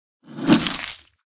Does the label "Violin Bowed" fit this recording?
No